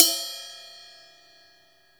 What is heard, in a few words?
cymbal tap; osu